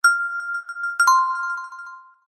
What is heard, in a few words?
Twinkle; 3CXPhone